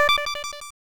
1-up Balloon; Donkey Kong Country 2; SNES

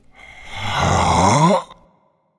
SFX welcome wickerman

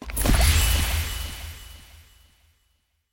Arcane Box; Caveblazers

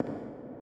footsteps generic

